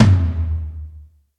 Royality free tom single hit tuned to the F# note.; tom drum samples